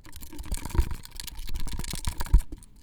Alien Chittering; BB Retail Therapy Sample Pack

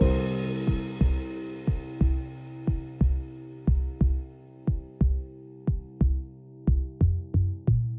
Tension Boop

